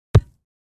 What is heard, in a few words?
Ground Hit Sledge Ham; rocks & dirt